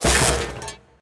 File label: shock Electric; eggomatic